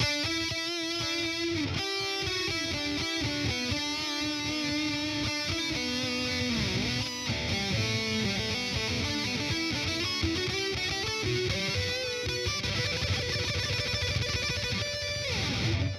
Guit; Metal Zone

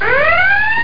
red alert; netrek

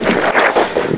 in lava; quake sw; player

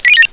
computer bleep, electronic sounds; Sound Effects for Multimedia - Volume 1

